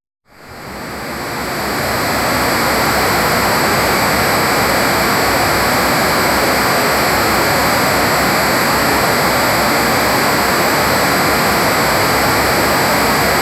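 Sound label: peaceful, farming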